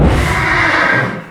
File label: reality rift cast; weapons; dota; chaos knight